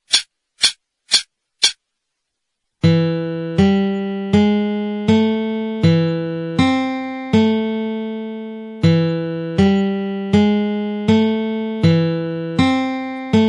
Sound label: Pentatonic 40